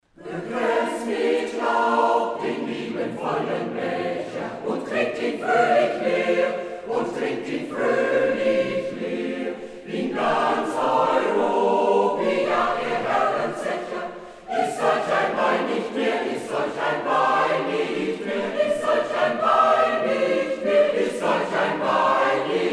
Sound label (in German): Rheinwein Lied